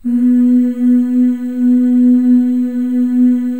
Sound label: FEM, Symphony of Voices; Spectrasonics